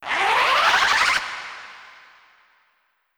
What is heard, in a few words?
build up, Digital FX; TRUTH-HZ; Crowdsourced Sample Pack